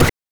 BANK2 SFX, NES DPCM; MIDINES

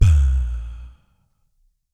VOC PERC, Vocal Planet Jazz FX BASS PERC

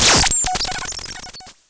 Cwejman S1 - Fritter Electric

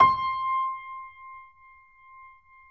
C 5, Keyboards Acoustic, GRANDP ST; USB Soundscan vol.17

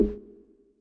scrub, Yamaha Tenori-On; Scrubkit

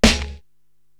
SD Defness, Korg TR-Rack drum machine

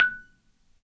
Marimba, -; Roland LCDP11; Africa VOL-1; Af.Marimbas

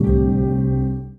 Gong Harp

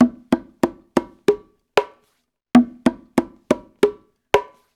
TONGA, Ethnic Drums, African; African Contemporary; Spectrasonics; Supreme Beats

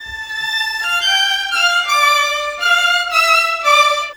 Rock Pop Violin, Orchestral; Sonic Foundry (Sony Creative Software); Orchestral Series VOL-4 - Rock and Pop

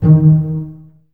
BSS D#3 Pizz, String Ensembles Basses; Miroslav Vitous

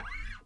scrape; Northstar - New Gold VOL-1; SPEC.FUNK

